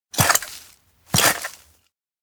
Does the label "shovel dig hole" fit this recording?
Yes